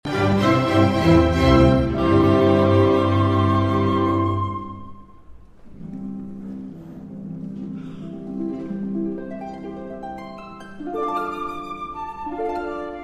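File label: Flute Harp, Flute and Harp Concerto Cadenza; Mozart Flute and Harp Concerto Cadenza to Movement #1; live performances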